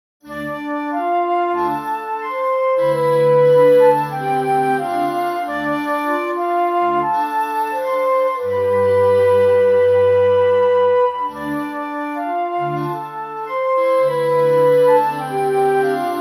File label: cinematic